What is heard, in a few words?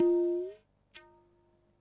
hi hit, tabla; Dirt-Samples